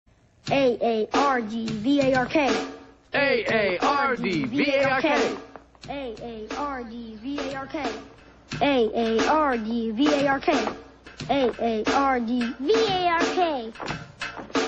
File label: Is Calling Rap, ringtones